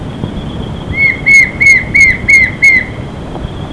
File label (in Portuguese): Male, PARNA Foz do Iguacu